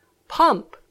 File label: pump